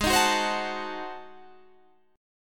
G sharp 7th Flat 9th G sharp down Guitar Standard, chord; G#7b9; strummed; JGuitar